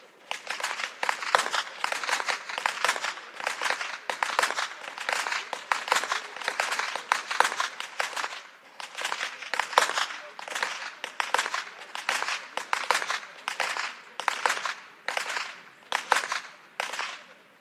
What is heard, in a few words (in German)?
schnalzen, jugend; waging